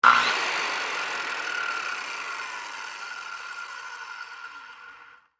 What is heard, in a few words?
Circular Saw Run, Industry, Circular, Saw, On, Run, Off, Short, Burst; Saws; Blast Wave